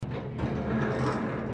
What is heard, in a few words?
Accordion door opening